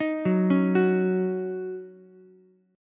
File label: D sharp Minor 7th, Ukulele; arpeggiated; JGuitar